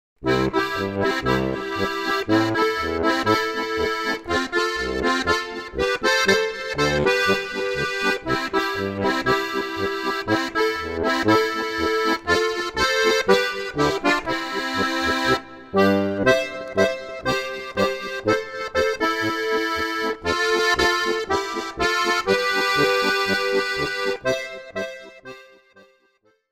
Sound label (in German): Polka; Hoellental